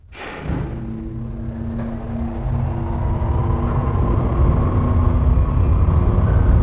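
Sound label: door large Hangar start; Theed; Star Wars Obi-Wan; XBOX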